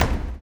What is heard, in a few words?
STOMPS; HOW IT IS; Spectrasonics; Vocal Planet; Blues Soul